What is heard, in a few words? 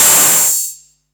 sizzle open high hat a sharp key, open hi hat sound tuned to the A# note